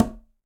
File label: Conga C Tip, Latin Percussion; Drums; Drum Hits; Ableton; Factory Packs